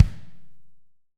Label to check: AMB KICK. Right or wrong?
Right